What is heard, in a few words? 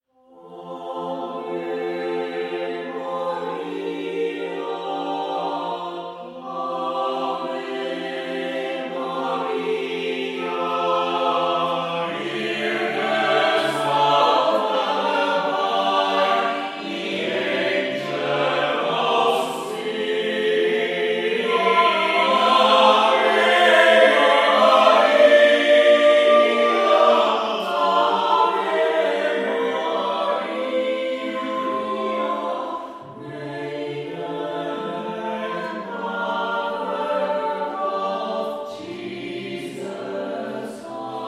Christmas lullaby